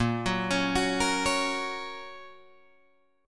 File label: Bb Minor Sharp 5th, Guitar, Open G; 8,11,11,11,11,11